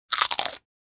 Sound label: Bite crisp crunch single